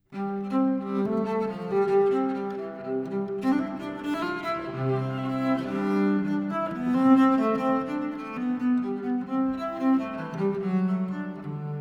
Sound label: Suite for solo cello in G major, Gigue; BWV 1007; Les Voix Humaines; Abel, Bach, Marais, Sainte-Colombe